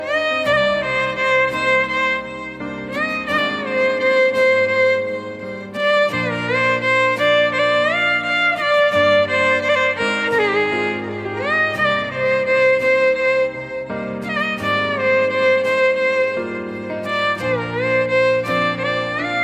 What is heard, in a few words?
Violin Love Instrumental Ringtone; Ashiqui 2